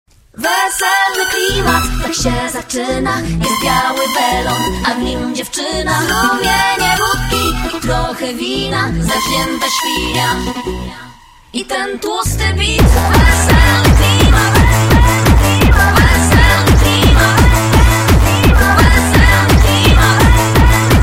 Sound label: weselny klimat hardstyle remix; human sounds